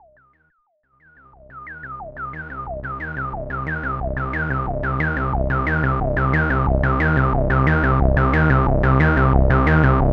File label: Filter Resonance by Mixerlevel